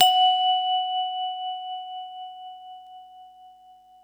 mallets

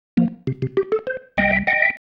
Pattern, Organ

